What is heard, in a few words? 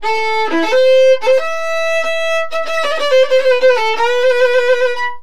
VIOLIN, Balkanish Traditions